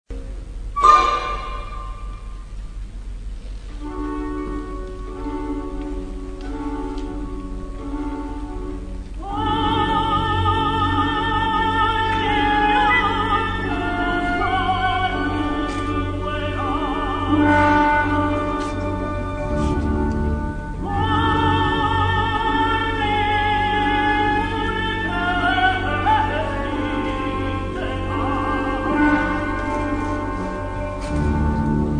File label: carmina burana Orchester; trier